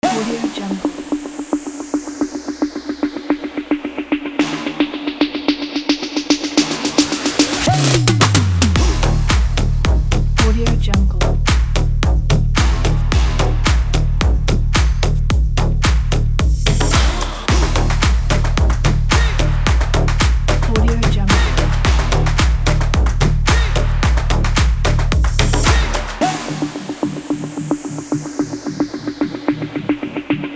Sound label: techno